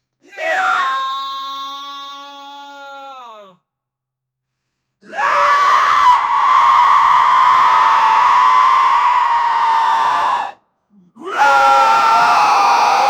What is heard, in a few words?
horror game dead soldier, battle cry